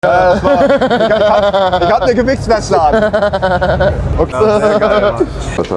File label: laugh; neanderthaler